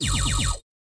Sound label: Lazer